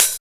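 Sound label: OHH, open hi-hat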